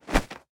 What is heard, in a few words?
punch miss; gmod; arccw uc commons attachments